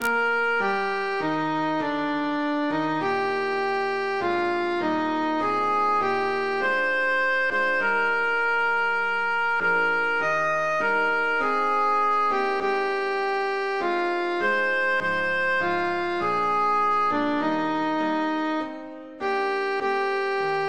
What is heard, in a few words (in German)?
abschied vom walde sopran